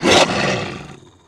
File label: zombie dog attack